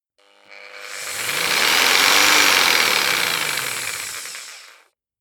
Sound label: jigsaw engine medium slow medium length